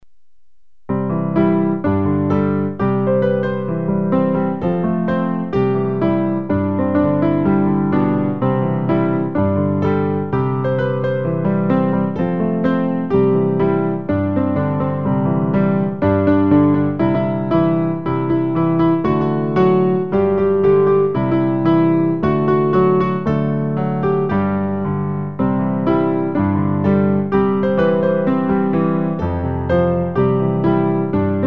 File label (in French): piano; recueil Louez Dieu